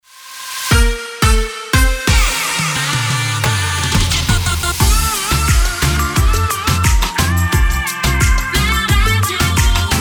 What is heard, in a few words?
AFRO HOP